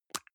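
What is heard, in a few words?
deodorant can lid open soft; haus-garten; badezimmer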